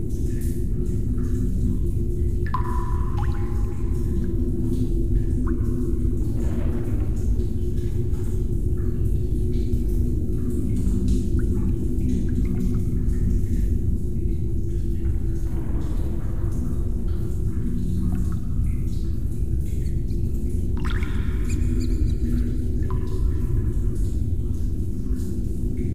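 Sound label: cave ambience; theGoonies; Retro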